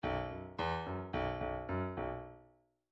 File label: bdf midi file grooves rock d rock d